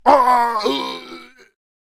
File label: Giant Death Vocals